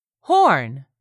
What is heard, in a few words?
HORN